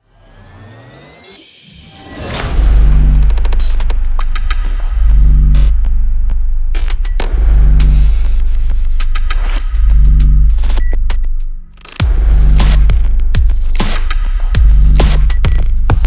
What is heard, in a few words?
Sport Glitch Trailer; background music